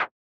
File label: foot; clash and crowd